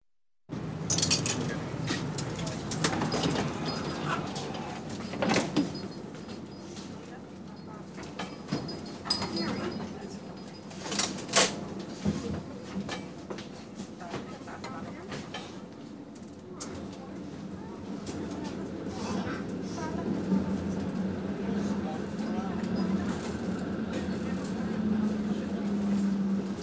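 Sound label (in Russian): в салоне автобуса оплата проезда открывание-закрывание дверей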